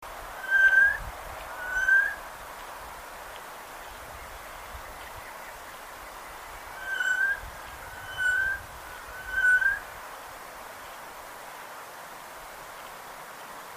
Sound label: Garrulus glandarius; SETE